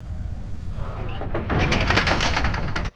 distant metallic crash with rattling and creaking

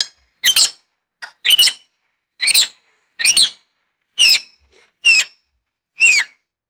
sharp coatimundi chirps and squeaks; animals

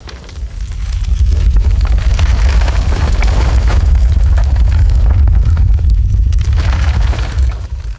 deep earthquake rumble with sliding rocky debris; natural disaster

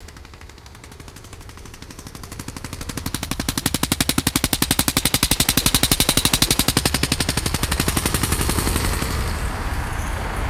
mechanical jackhammer and vehicle pass by; tools

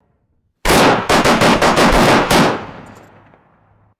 five rapid pistol shots and a click; guns

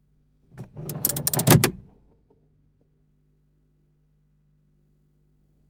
vintage car mechanical handbrake pulled

